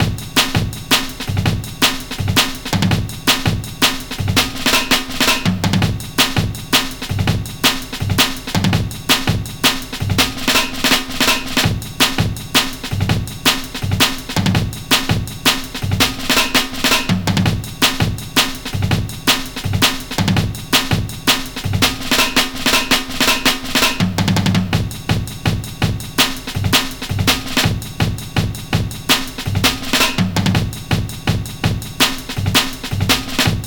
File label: 165 breaks; Lockdown Breaks